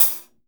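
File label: hi hat; Best Service Gigapack 2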